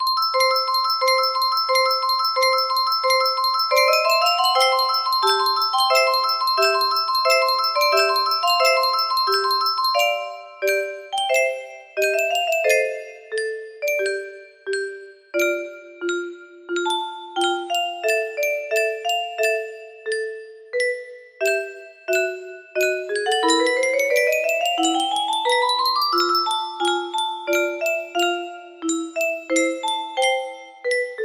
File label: music box version